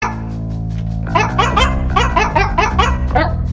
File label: DOG TONE